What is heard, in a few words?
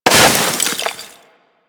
Melee Impact Snow; Warframe; Weapons